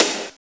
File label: SNR, Tekno Industrial; East West